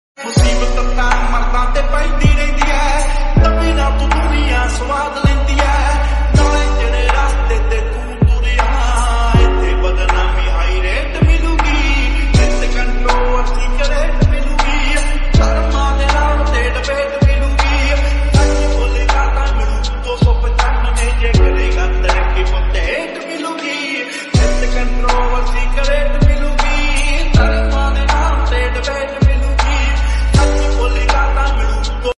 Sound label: lofi ringtone, bgm ringtone